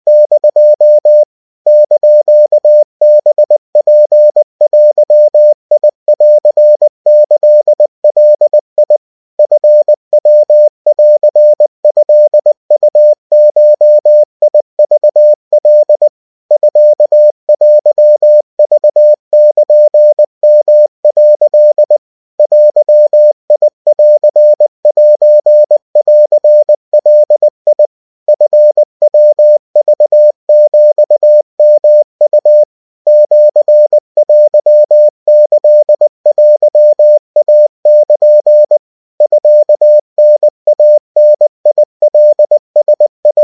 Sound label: Japanese 70cpm